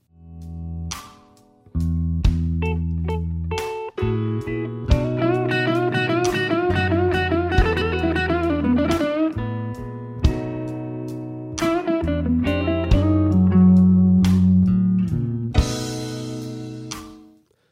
Slow Blues in G Lick, guitar

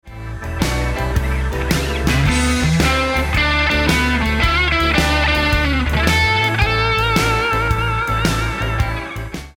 Licks Lick, guitar; Beginner/Intermediate Shuffle Blues licks

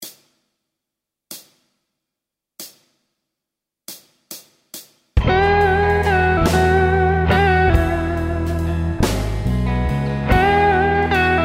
Solo Performance

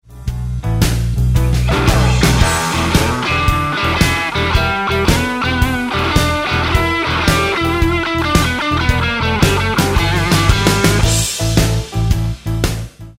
Roadhouse Licks Lick, guitar; 20 Roadhouse Licks

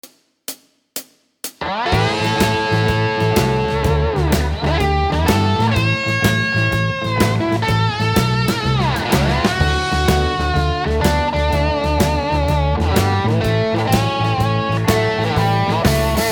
Standard Tuning Slide Solo, guitar

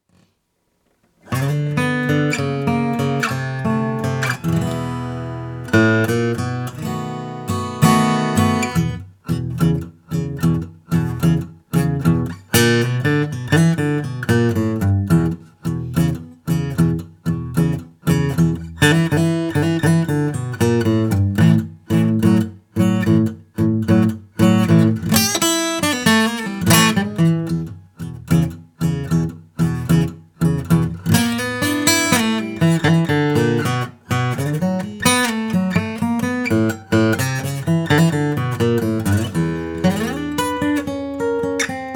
Acoustic Blues in E Performance, guitar

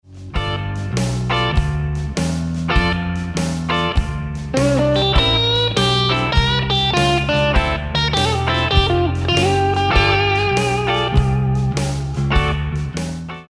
Reconsider Lick, guitar

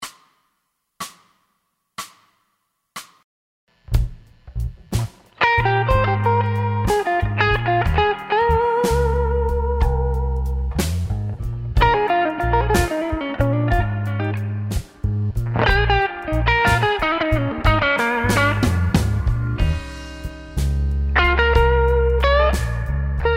Bb Intermediate Solo

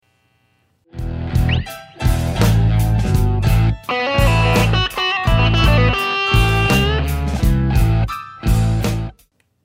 E Blues Lick, guitar

